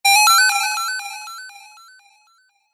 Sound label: multimedia game sound positive action tone; zapsplat